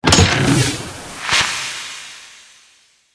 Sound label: free Spin Chest; fairyhansel